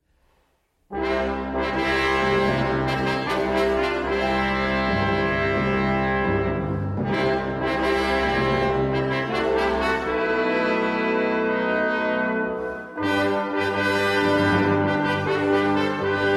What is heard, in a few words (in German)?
Festfanfare